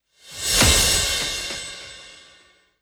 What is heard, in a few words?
Simple Transition